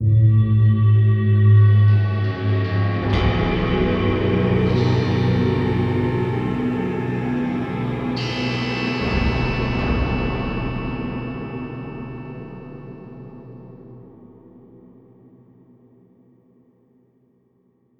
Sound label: Mood Player Death; The Long Dark